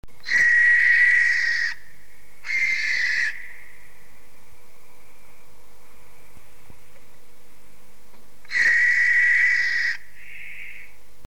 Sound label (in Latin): tyto novaehollandiae